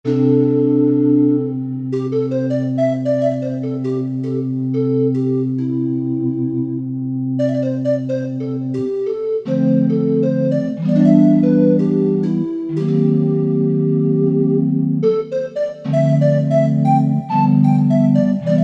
Pan Pad, Korg MSC-10S Ethnic

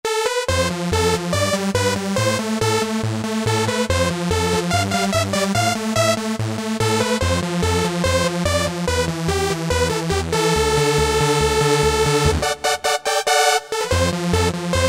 Euro Remix, House Collection; Roland SR-JV80-19 House Collection

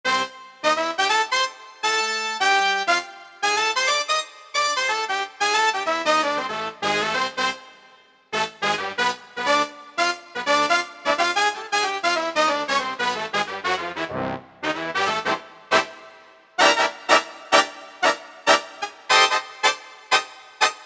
5th Combo

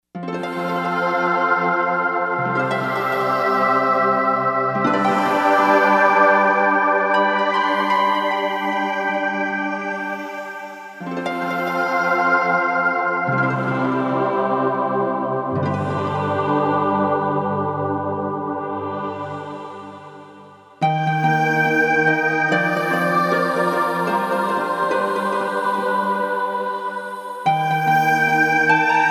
Heaven Harp, Korg MSC-4S Orchestra; Orchestra 1

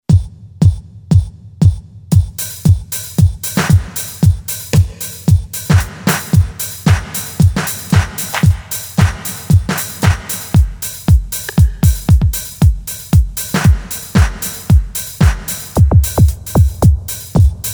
Dance Kit, Yamaha PSR-550 Voice

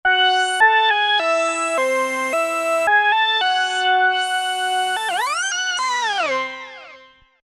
CS Lead, Roland V-Synth; Factory Patches

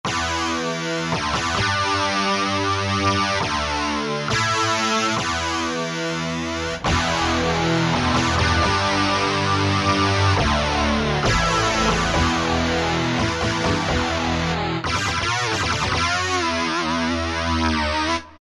HEAVY. EG, Guitar And Keyboards

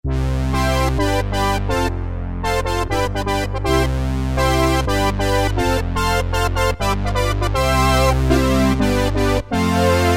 FatBrass, Roland MKS-50; Factory examples

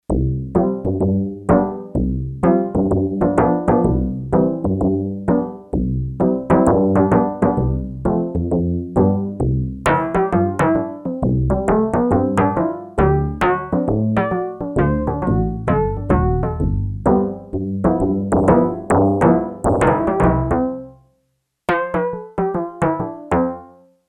Rubber Gong, Yamaha TX802; Factory Patches; Voices

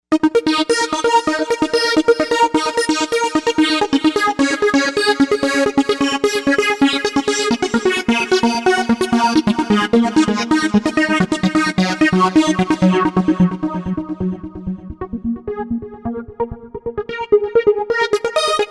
Cybertronic, Novation UltraNova; Factory Patches

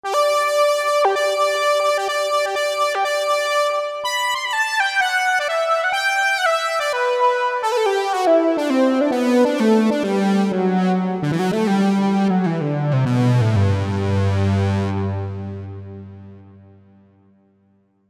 Vox Saws Lead, Roland SRX-07; Roland SRX-07 Ultimate Keys

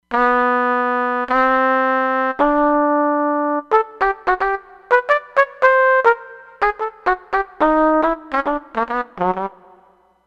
Flugel Horn

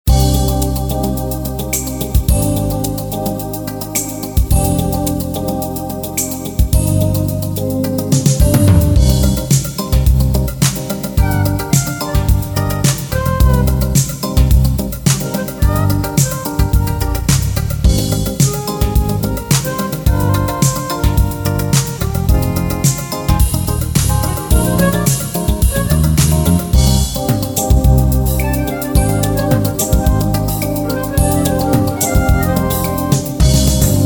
Casio CTK-601, Rhythms, 16 Beat

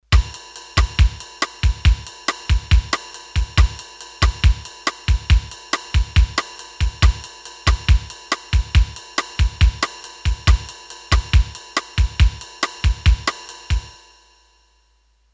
Bossanova, Roland D-10; Rhythm Demos

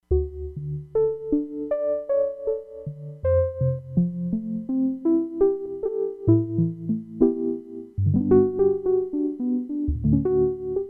SINUS TONE, Crumar Bit 99; Factory Programs

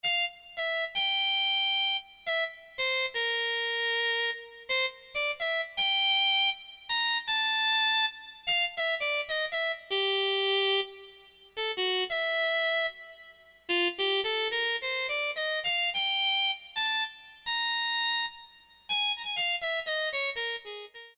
MUTED TP, Korg DSS-1

